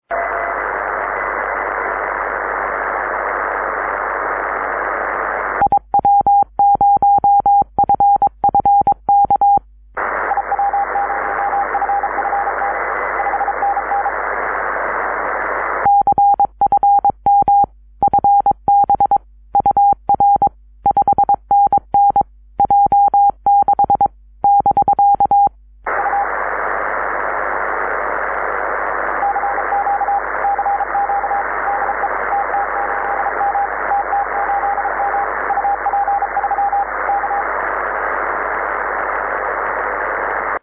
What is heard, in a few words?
cw; tt8m; 6m